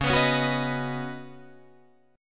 C sharp 9th Suspended 4th, C sharp, down, Guitar, DADGAD